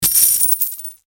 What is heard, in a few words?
money